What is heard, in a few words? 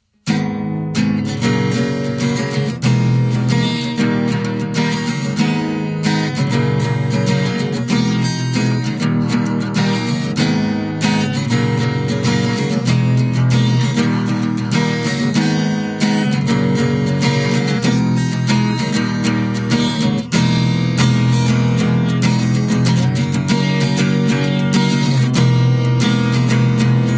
guitar